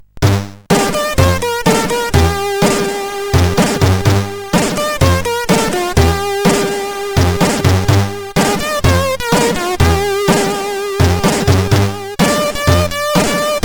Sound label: Trebbler, sid CSG8580R5